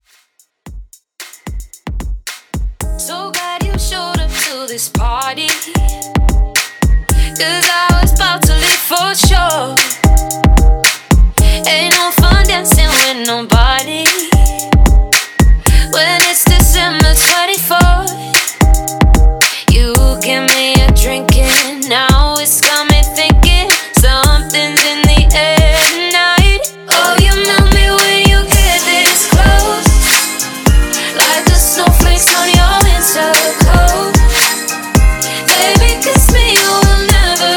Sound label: Christmas, 112 BPM; ReDrum